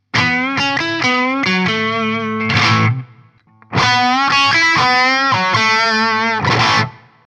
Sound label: blue neck & bridge; Rambler 25W